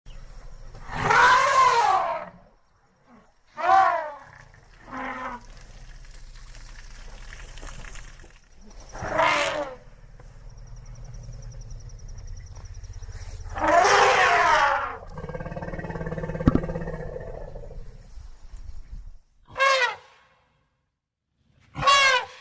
Majestic Elephant Trumpet Sound Wild Safari Ambience